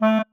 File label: marimba penguin; ゆめ2っき